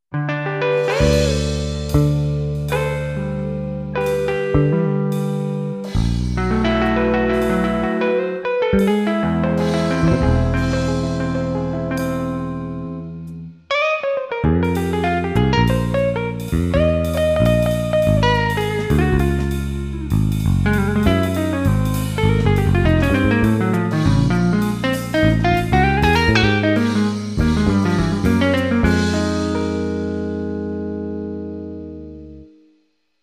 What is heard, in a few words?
Jazz Guitar; SD-90 MIDI